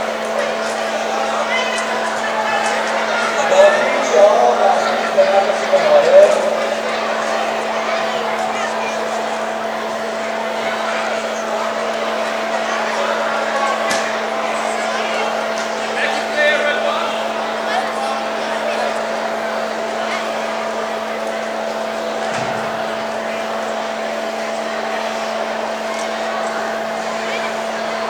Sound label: whistle; HTWK; Dutch